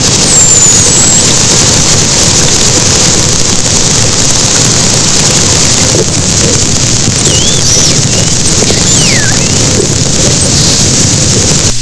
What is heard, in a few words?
Delphinus bairdi